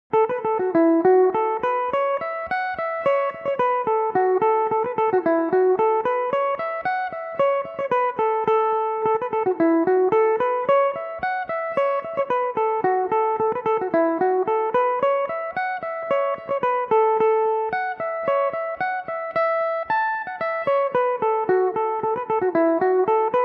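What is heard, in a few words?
A major, irish mandolin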